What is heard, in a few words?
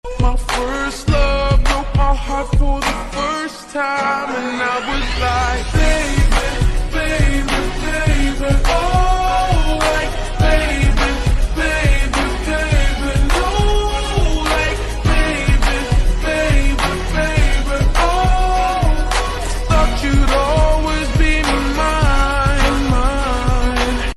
aesthetic english ringtone